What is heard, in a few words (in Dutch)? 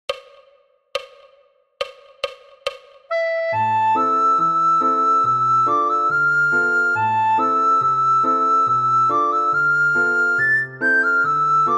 sopraan; La cinquantaine; Gabriel Prosper Marie